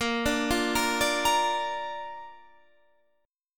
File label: Bb Major Bb down, chord; arpeggiated